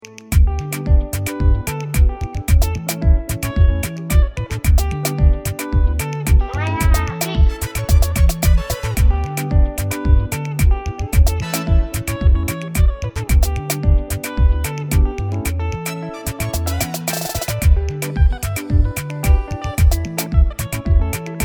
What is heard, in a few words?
afrobeat track